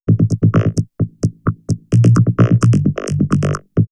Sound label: Vocoder Loop; X-Static Goldmine; VocoderLoops